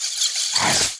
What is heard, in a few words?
Spider Atk